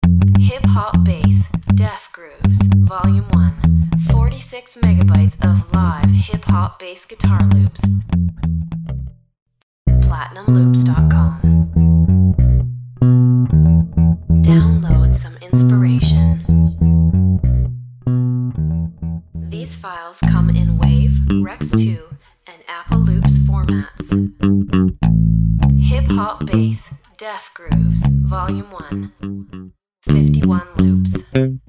hip hop bass grooves

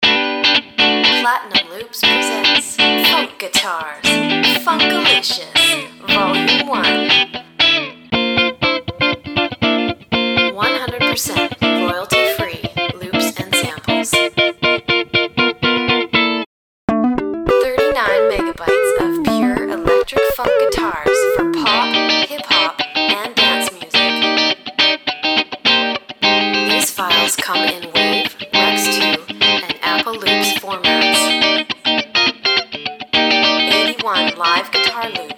funk guitar funkalicious

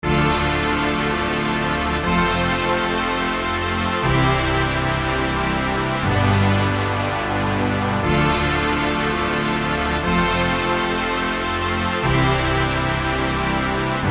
horn

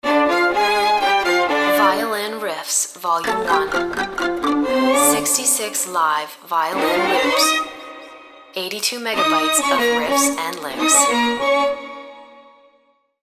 violin riffs

